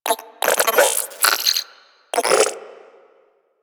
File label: Guild Computer Beeps; Ambience; Warframe